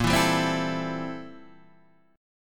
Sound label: A Minor 7th Bb, Am7/Bb chord; Am7/Bb strummed; JGuitar